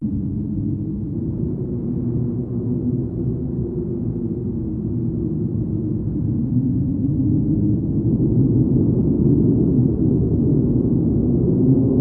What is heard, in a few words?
hallow loop, ambient; Garry's Mod; tf2